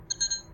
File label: message chime